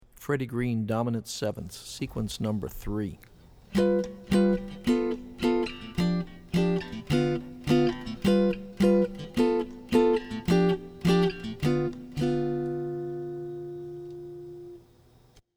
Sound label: D mnt 7ths Seq; jazz chording